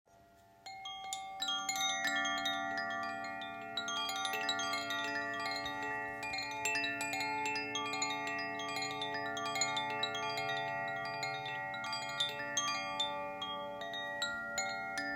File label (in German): Wasser